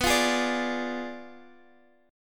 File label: B Diminished 7th B Guitar Open D